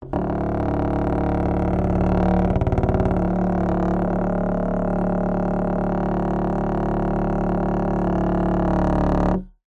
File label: foley plastic hollow vibrate rattle